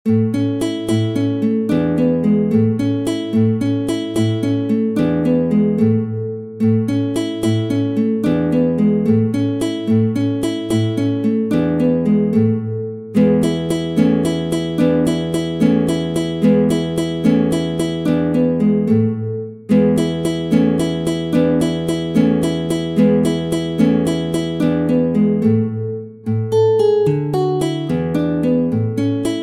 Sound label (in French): Walze, Méthode pour la guitare; Ferdinando Carulli; Op. 241; Classical guitar